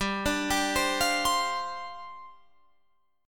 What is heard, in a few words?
G 7th Suspended 4th G down Guitar Open F